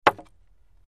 Wood Staff Hits Mediu, spears & staffs

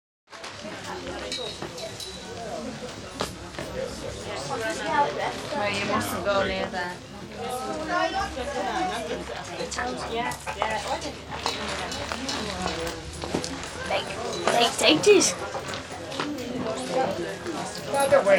Barbecue Atmosphere, chatter walla

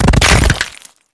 Concrete Crash, rocks & dirt

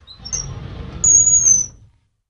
Drawer Metal Open Qu

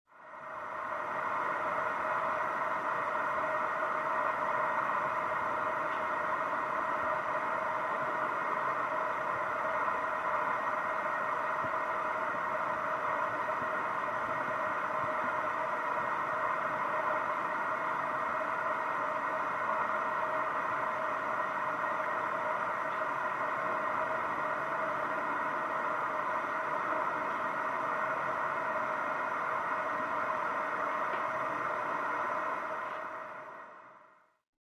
Water; Flowing Through Pipes, Through Wall.

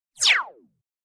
Laser Classic Blast

